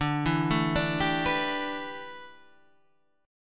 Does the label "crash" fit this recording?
No